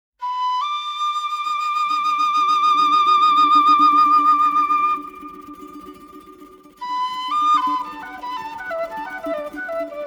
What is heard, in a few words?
quena